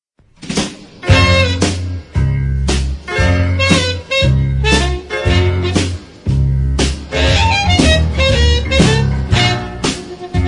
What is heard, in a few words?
Sharp Harp, Blues; Rock